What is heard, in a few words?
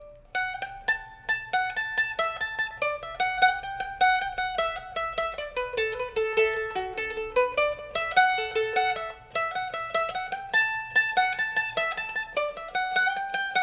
banjo